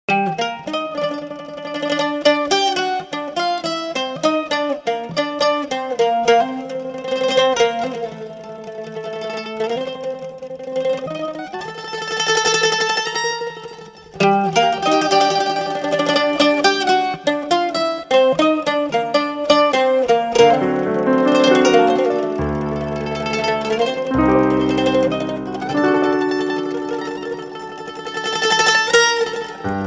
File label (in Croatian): Parti vrime instrumental